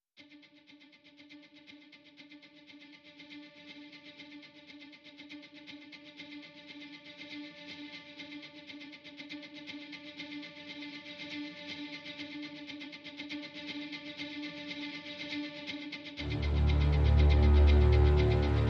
Guardian Angel, Strings

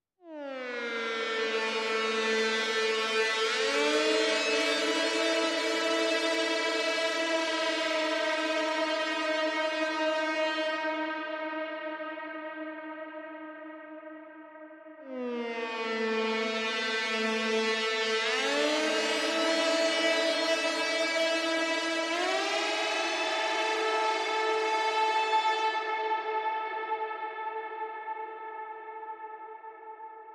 Lead

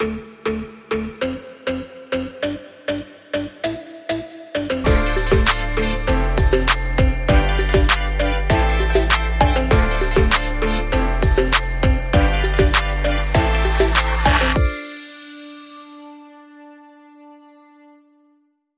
Funk

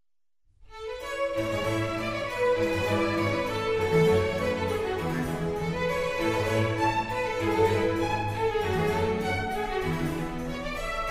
Concerto for Strings in A major; RV159; Antonio Vivaldi

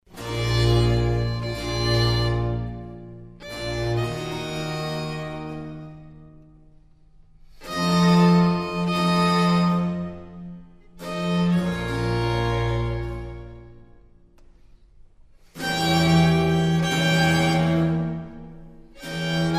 Concerto in G major for strings & bc; Alla Rustica; Antonio Vivaldi

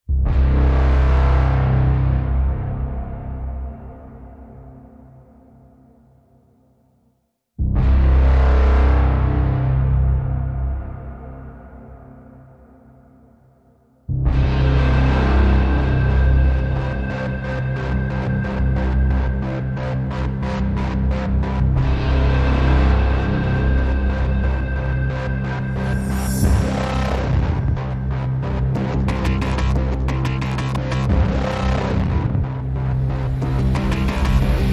Underscore

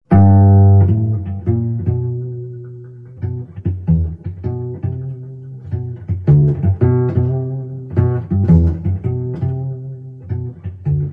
Slow Pizzicato Blues

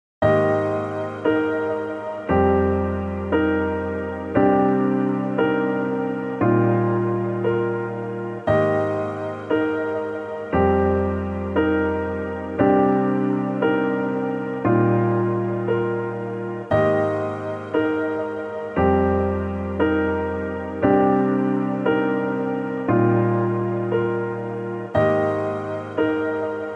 Piano Only

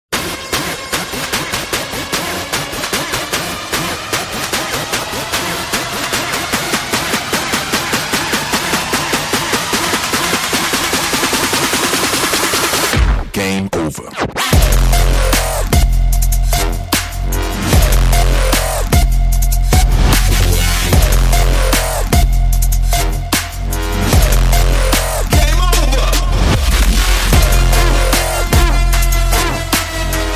Light Percussion

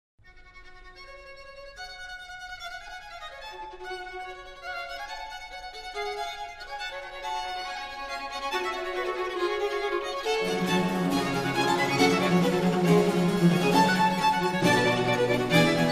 Concerto in G minor for violin strings & bc; Antonio Vivaldi

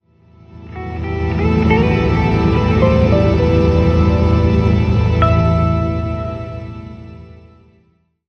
Link Sting

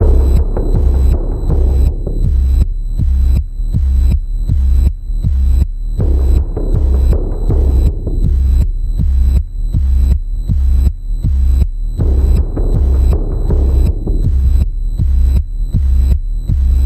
Panning Pulse C, Key of C

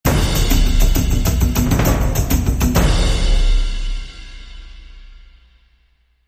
Thunder Drums Short Build

